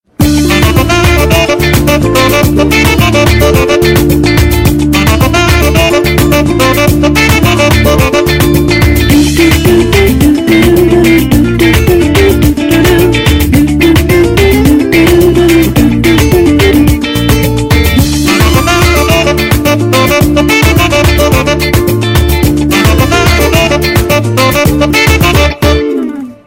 Latino jazz, ringtones; Samsung Galaxy S CDMA SCH-i909

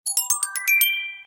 music mail, notifications, Samsung; Samsung GT-i7680; cmcc

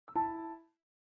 Close, Nokia E75

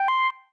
Voice recorder warning; Samsung; GT-M8800 Pixon

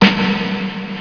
rev snare loop; miniBAE source code audio

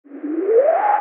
HAPTIC JUMP; Sharp; AQUOS sense lite SH-M05

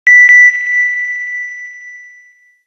pulse alert, Nokia; 8800; Alert tones

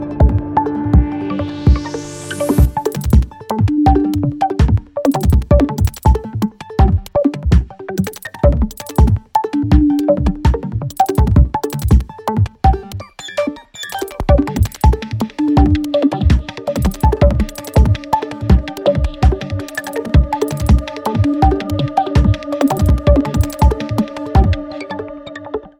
Jumping Dots

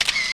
camera click; NGM; WeMove Miracle

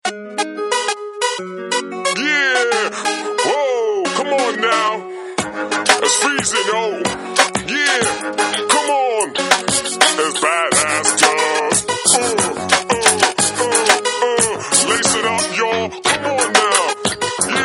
Hip hop Guy; Samsung GT-S7120U

